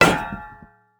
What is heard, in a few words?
metal; Rust